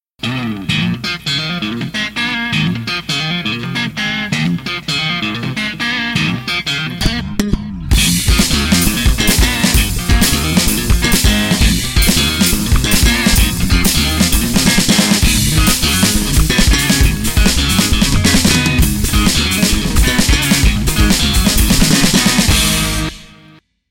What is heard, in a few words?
fender; FENDER ULTRA RIFF コンテスト